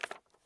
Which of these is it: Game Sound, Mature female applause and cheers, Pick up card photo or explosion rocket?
Pick up card photo